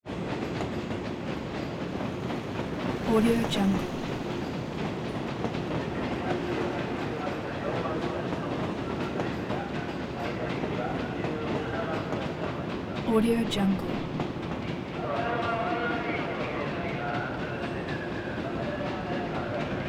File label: japanese station ambience footsteps chatter; prosoundfx